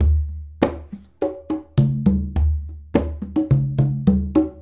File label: Congas; Essential Percussion